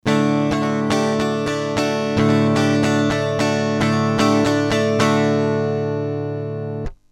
M Track Duo Gitarre

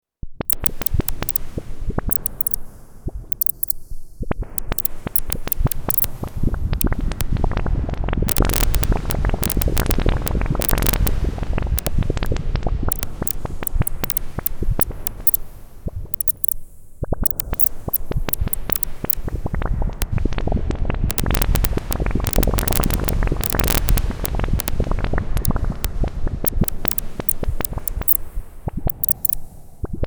Sequential Pro 3 Wavetable LFO moduliert Filterfrequenz

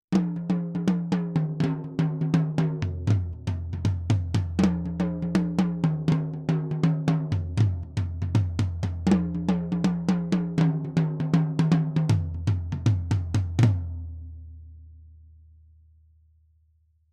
Toms ohne 33609N; AMS Neve

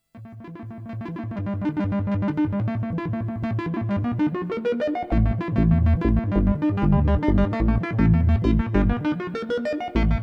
Ringmodulator

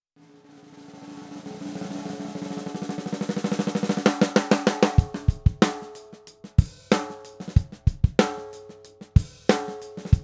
Messing mittel offen

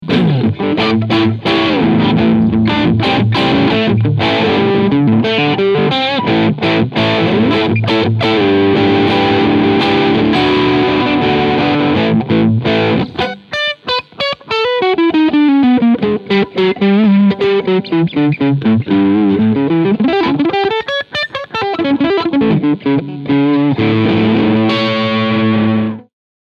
Ibanez RGA60AL Crunchsound beide PUs

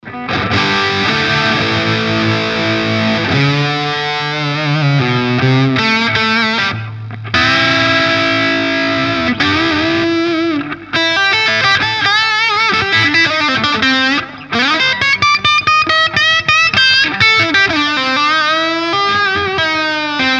Epiphone Les Paul Custom Steg verzerrt

